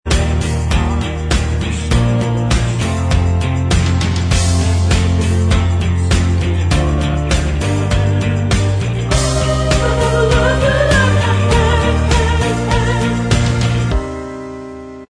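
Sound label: backing vocals, backing track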